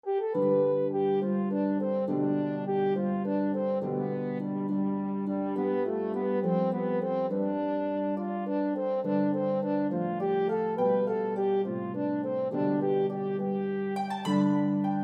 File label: Harp and Melody Instrument in C Horn; Banish Misfortune